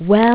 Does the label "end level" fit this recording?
Yes